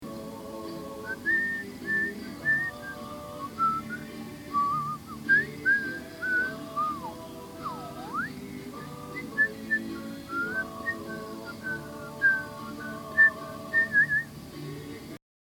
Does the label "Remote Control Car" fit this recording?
No